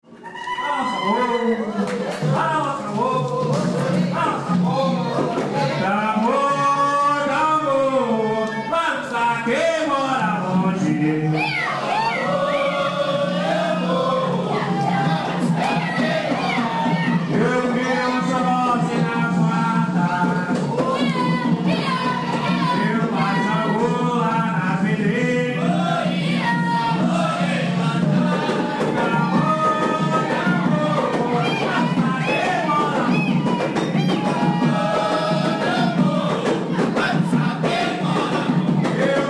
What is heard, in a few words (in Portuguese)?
Caboclos Tambor; PONTOS CANTADOS